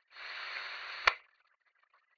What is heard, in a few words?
radio static